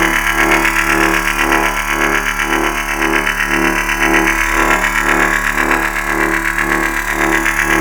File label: Split Laser Beam Loop; Warframe; Corpus